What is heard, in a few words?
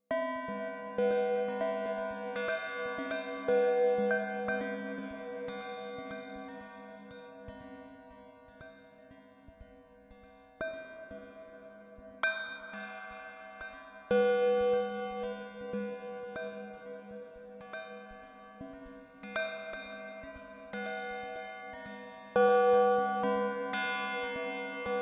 The Bells